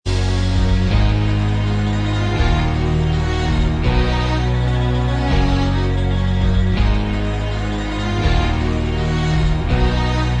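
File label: Instrumental Track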